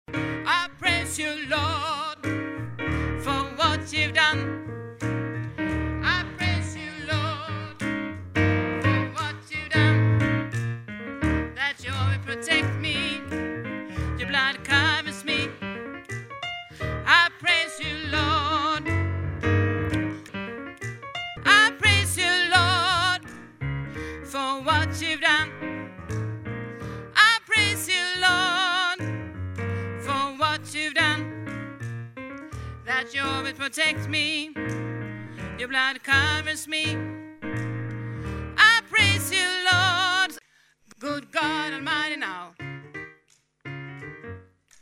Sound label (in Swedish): sopran